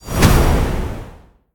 wind hit; smite; tiamat

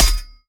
trident hit; smite; poseidon

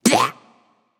grunt attack, voicepack; smite; jorm

